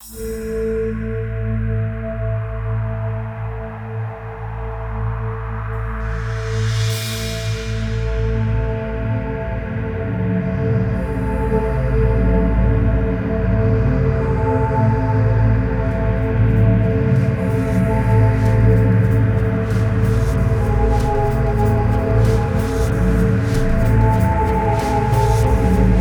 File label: Cave Music